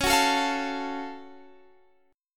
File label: Gb Minor Major 7th Db